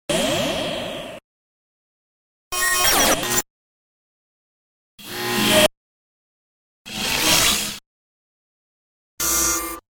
5 Sounds Production Elements, Electronic

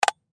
Power Drill, Power Drill Switch Direction; Tools; Industry; Blastwave

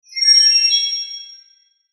Shimmer Blurp Chirp

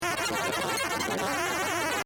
SciFi Spaceship Radio Bad Connection; Communication; Weird Alien Noises; Crafted Signals

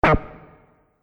Multimedia Button; Business Programs, Apps And Computing Noises; Andrew Fleming

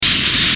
static distort; Static Distortion Hiss; Production Element Imaging Element Accent Transition; Blastwave

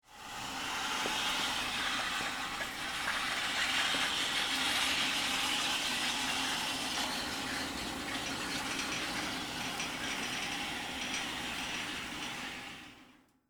Fly Rail Lower, Theatre, Stage; Foley; Film Set Sound Effects; BlastWave

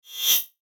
FLASH ELEMENT HIGH TECH; Multimedia; Blastwave

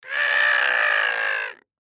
Human Scream; Scream Screech Male Old; Blastwave

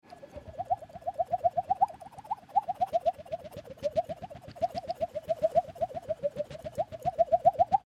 Creature Fantasy Fly Flying; Monsters; Horror; Big Room Sound

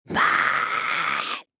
Monster Speak Growl Creature; Horror; Monster Sound Effects; Animal

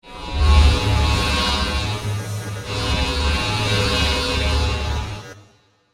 Scanner Beam Heavy, Scifi; Science, Fiction; Tech

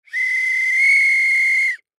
Whistle Metal Blow, Whistle Metal Blow Long; Whistles; Foley; Objects; Blastwave

